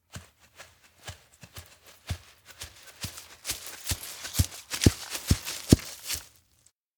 steps outdoor wheatfield approach; baron; anemoi

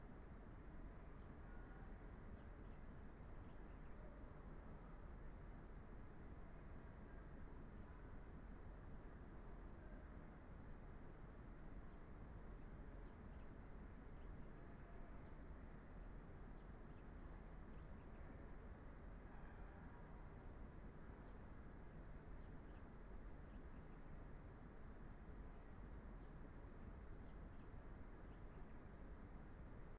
indoor observatory night; LOOP; anemoi

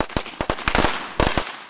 Firecracker; anemoi

